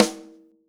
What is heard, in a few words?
Yamaha Holz L hand